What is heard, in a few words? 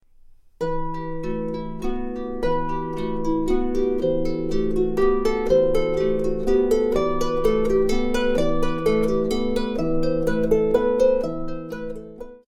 harp